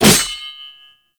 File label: Whoosh A; Warframe; Weapons; Infested; Coda Mire